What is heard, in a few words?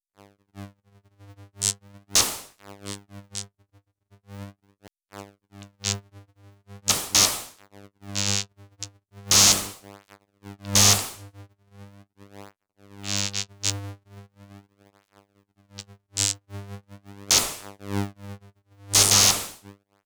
electricity